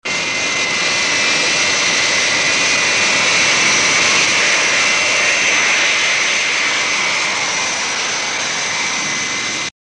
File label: transport Plane Flight